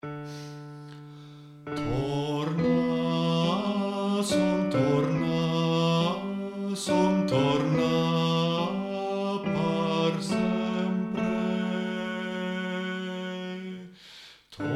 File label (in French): Baryton